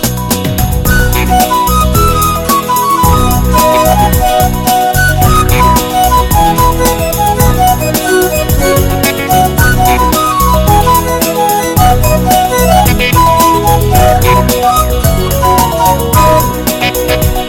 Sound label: Sixteen Beat Sounds